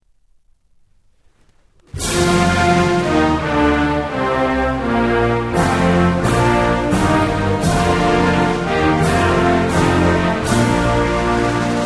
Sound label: Music; Norway; DCS